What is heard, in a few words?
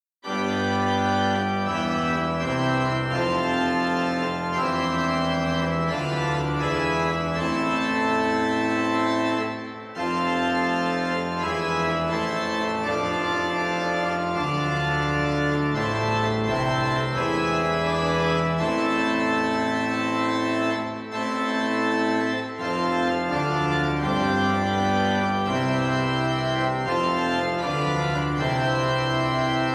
Tutti; Zwolle; Ps 15 Koraal